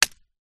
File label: impact stick hit tree trunck